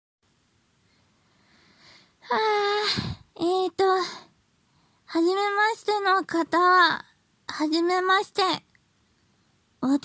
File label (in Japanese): 泥酔ディルドオナニー, ビチャビチャ音; THE FIRST SEXY